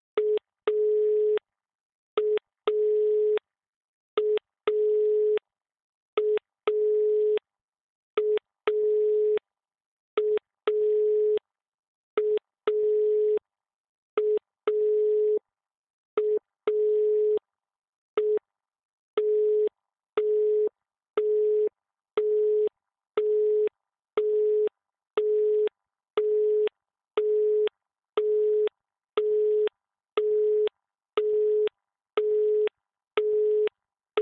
phone dial tone (dial tone, busy signal, howler); devices accessories utilities; NS Studios - ultra sound collection

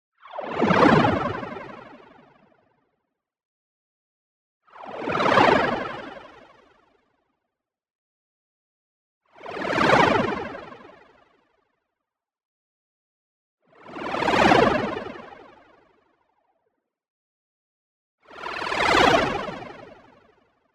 whoosh, lofi bubbles; boom library; Anime Essentials

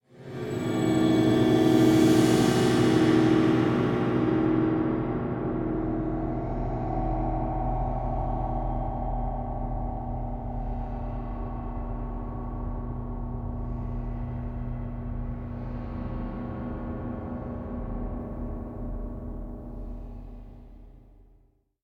Drone Eerie Cymbal Pad Short; Hollywood Edge; sound designer's toolkit v2

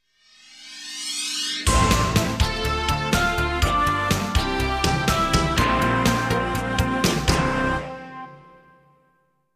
jingles; 90 DDD Jingles

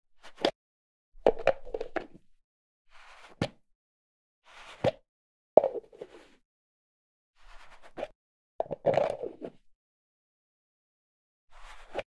cup open-close, household; NS Studios - ultra sound collection

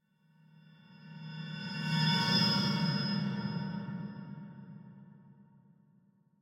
Metallic Stinger By Scary; Hollywood Edge; sound designer's toolkit v2

